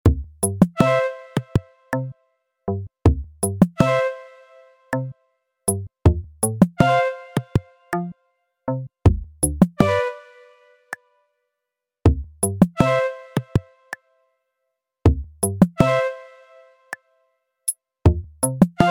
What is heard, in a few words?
recorder song; Animal Spa